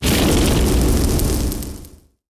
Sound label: burn book